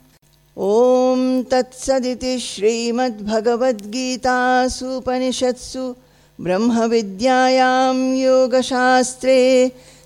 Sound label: Ending Chanting; chapter 13 kshetra kshetrajna vibhaga yoga; bhagavad gita; conclusion